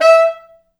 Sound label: E4, Pop Brass; Best Service ProSamples vol.19; AKAI